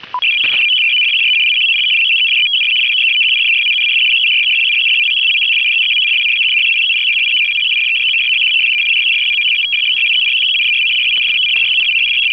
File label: TADIRAN DATA, MFSK DATA; TADIRAN HF-2000/HF-6000; PRC-2200 MFSK DATA